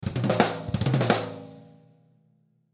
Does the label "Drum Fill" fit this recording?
Yes